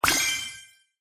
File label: ball upgrade